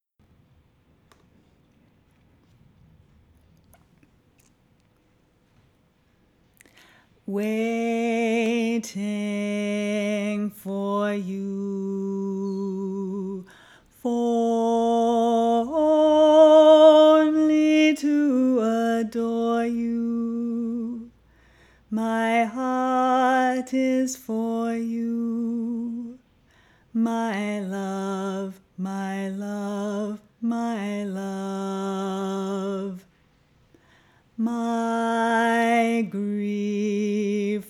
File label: tenor